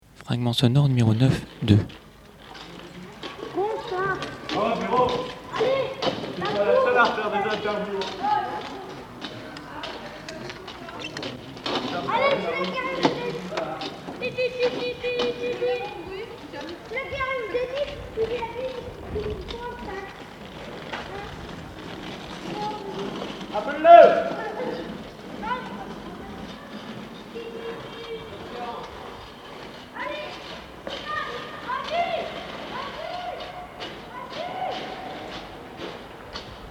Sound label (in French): pas jeux; proxemie acoustique habitat